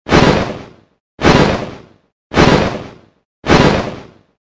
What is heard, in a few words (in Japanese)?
モンスターの羽ばたき 4回 遅め モノラル